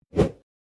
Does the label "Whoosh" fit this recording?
Yes